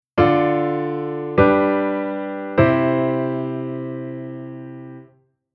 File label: Chord Diatonic Subdominant